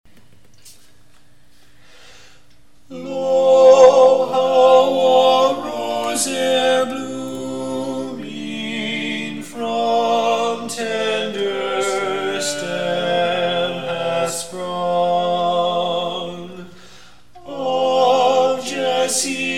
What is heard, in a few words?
lead; lo how a rose eer blooming